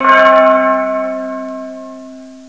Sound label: CHIME